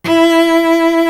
solo cello; East West; Global Sounds

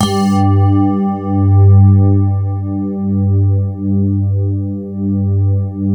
crystal pad; Sound Gallery - Charged

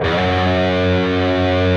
PRS G, PRS LEAD, Guitars; Spectrasonics; Hans Zimmer Guitars VOL-1

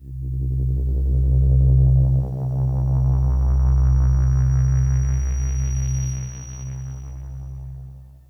Synth hits and riffs

